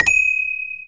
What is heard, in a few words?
coin; Nintendo; July 2020 Gigaleak